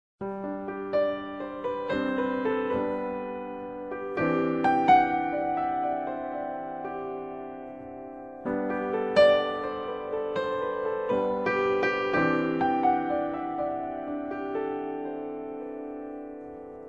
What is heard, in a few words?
Amazing Grace Instrumental